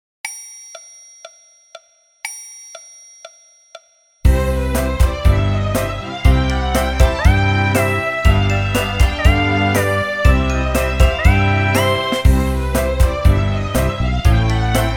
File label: Mary Had a Little Lamb Orchestra Version; مری بره ای داشت